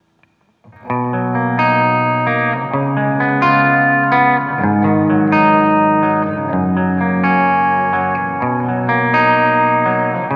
clean mic; Preset Beauty

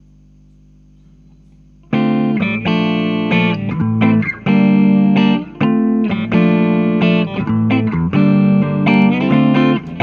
clean

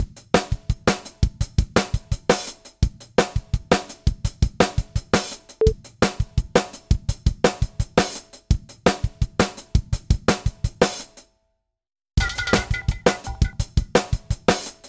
DRAWMER 1973 WET BIG AIR

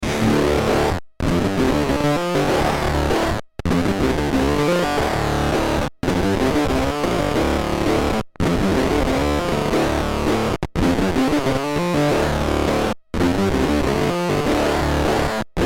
Swarm Fuzz Pedal; Beetronics

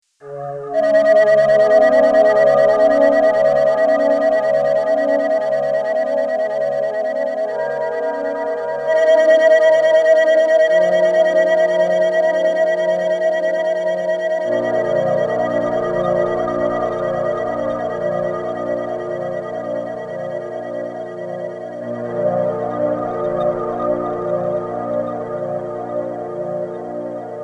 JD-800 Model Expansion

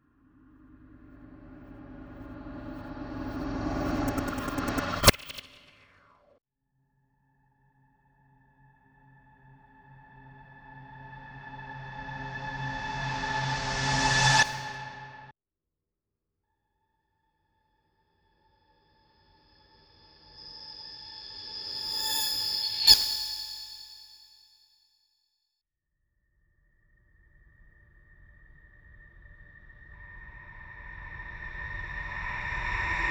Whooshes Long Mid

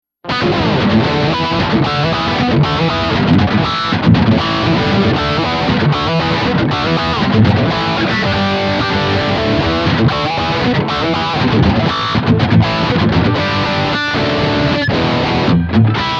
Distortion

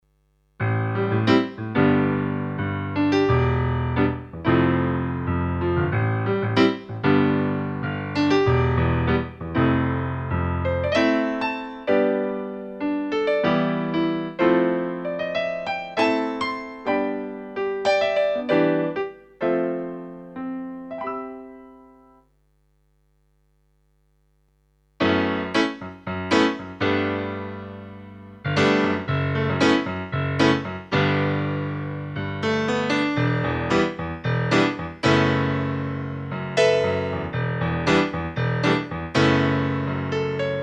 Pianos; CDP

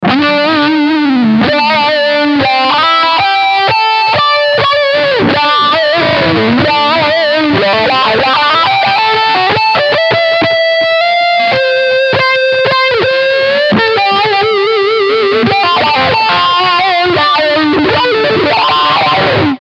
HPF Overdrive

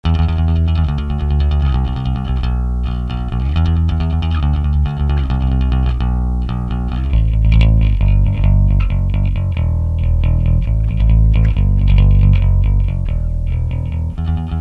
heavy

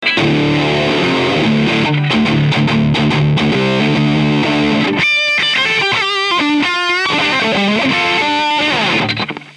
overdrive; amplitube

